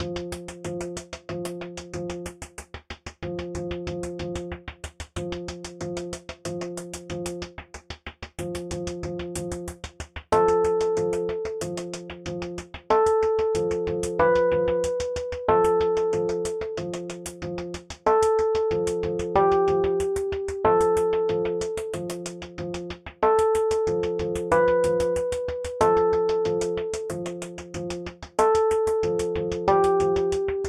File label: level music loop; tigrun